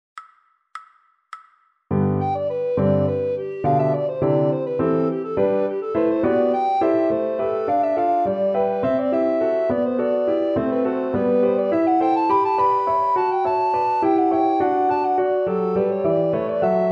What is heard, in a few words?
Recorder; Murril